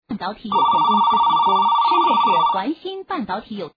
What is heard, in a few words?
Elec Ringback music